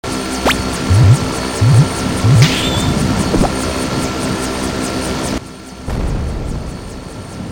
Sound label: Bomb; The Henry Stickmin Collection